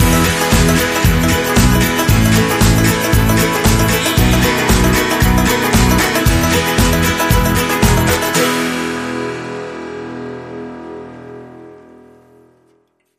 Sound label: Jester Laugh Sting Upbeat